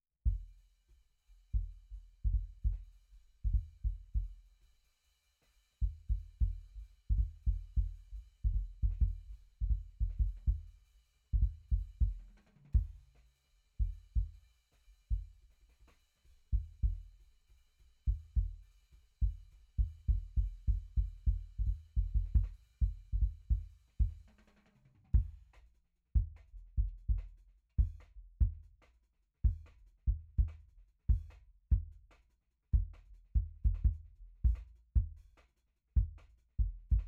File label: KICK SUB, drum n bass; RAW MULTITRACKS